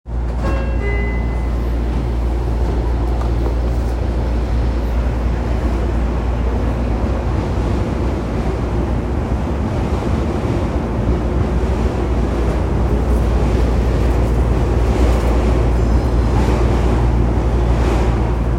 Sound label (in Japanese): 東横線中目黒駅北, train sounds